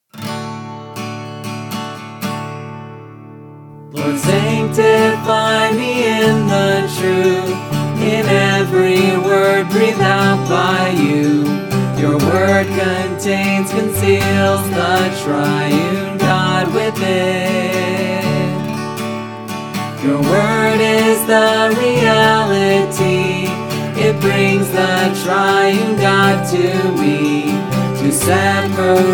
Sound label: sing, hymn; New Songs